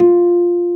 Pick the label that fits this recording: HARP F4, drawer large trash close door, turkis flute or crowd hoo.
HARP F4